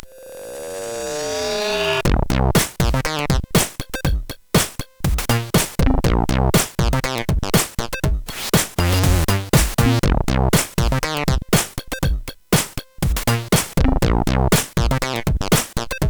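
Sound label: Happy Hip Hop, MOS 8580; hvsc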